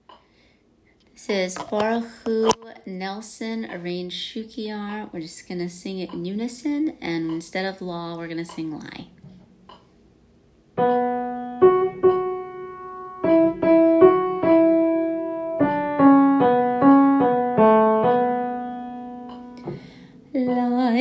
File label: Unison; Bar'chu Nelson; Veterans Day Shabbat